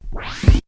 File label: REV TAPE; BEAT2000